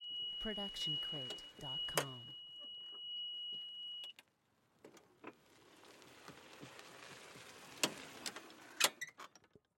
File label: golf cart reverse changing gear moving forward stereo